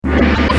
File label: gong